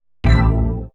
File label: HOUSE FX 125